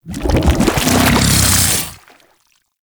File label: Unburrow Goo; Warframe; Undazoa; Hunting Critters; Characters